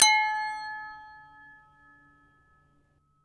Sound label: chime wine 432